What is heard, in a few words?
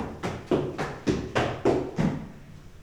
FOOTSTEPS, Science Fiction; Mower Man